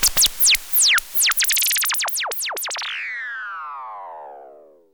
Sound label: FALL, SYNTH FX; AMG - Global Trance Mission VOL-2